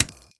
spin button click; disco danny

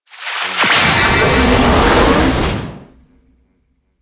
old koi transforms into dragon; koi princess

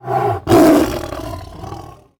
win Lion; jungle spirit

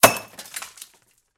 bottle Smash; dead or alive 2

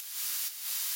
free spin music loop; spinsane

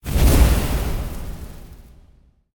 fire small start; rise of maya

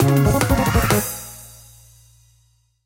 music free spin intro; serengetikings

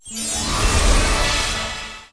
random Symbol Transform; fairyhansel

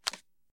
card Shoe; baccarat2

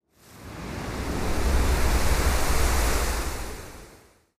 Camera movement upwards to top of waterfall; koi princess

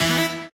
basic Win Line; mega joker

